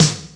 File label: SNARE, Big and Heavy Real Drum Kit; REAL LIVE KITS